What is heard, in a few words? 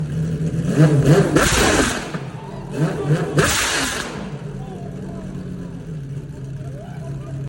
pagani zonda r engine